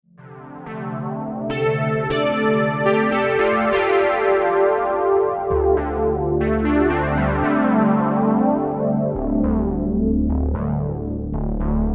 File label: noise synth